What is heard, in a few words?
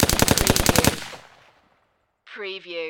Machine Gun Colt AR-15 Long Burst Medium Range